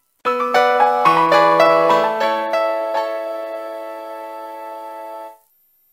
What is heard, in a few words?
Nokia Tune; Evolution 3650